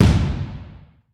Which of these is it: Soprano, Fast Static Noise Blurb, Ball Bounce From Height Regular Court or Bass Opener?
Ball Bounce From Height Regular Court